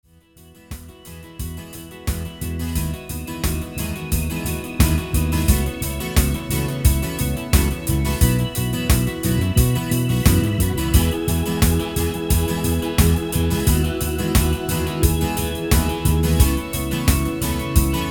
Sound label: Rock Mix